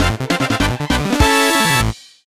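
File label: Jingle Fanfare; game builder garage 2021 switch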